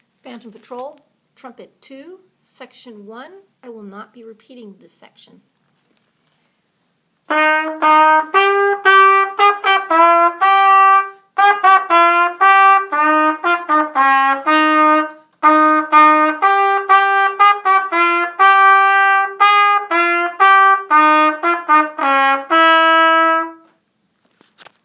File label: Trumpet; Cornet